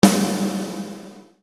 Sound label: Snare Dr